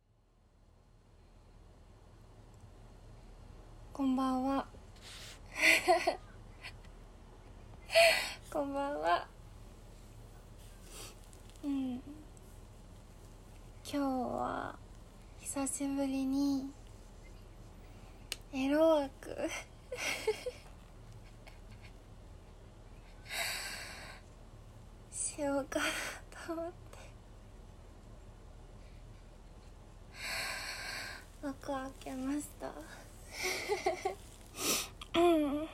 配信風実演オナニー

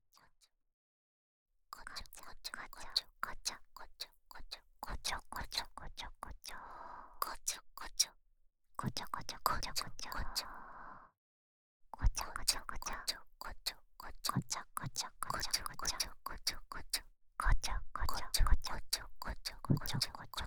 くすぐり・寝息; 【前作体験版】フルスタン王家の淫語言葉責めレッスン。～王女とメイドと練習台になったぼく～; ASMRダイジェスト

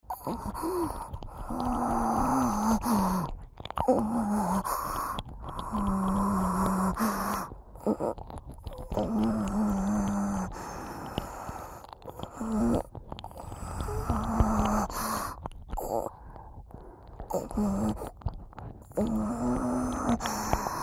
Lオホ喘ぎ＋Rれろれろ耳舐め; 耳元オホ声、耳舐めループ; オナサポ

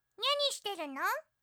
一般の警告音

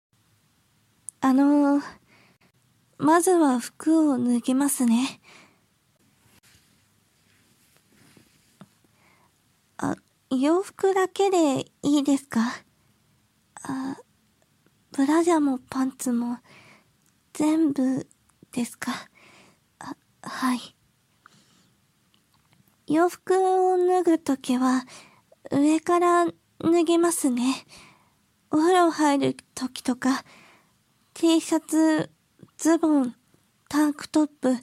実演オナニー音声

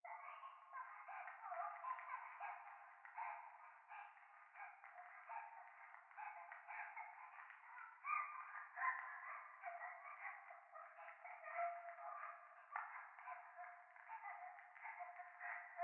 教会 可愛い喘ぎ 暖炉

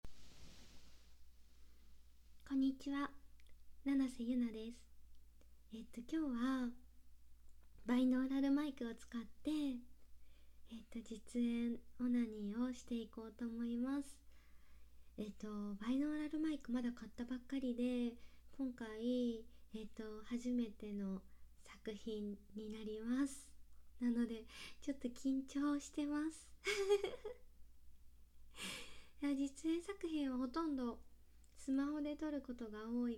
おまんこの音完全特化 耳元のクチュ音が脳まで響くASMR

